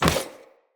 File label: Player Short Sword Catch; Weapons; Swords